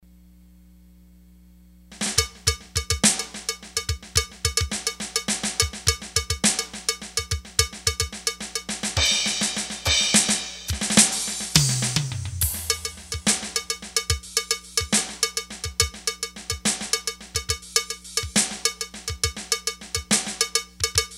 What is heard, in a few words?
cowbell; grooves